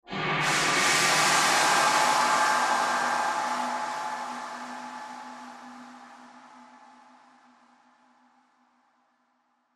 Horror Swell Airy Metallic; Scary Tones; Blastwave

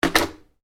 Phone: Domestic Handset, Put Down; Telephones; Electronics; Telephone Sound Effects; Telephones (Sound Effects:Electronics); Stuart Duffield

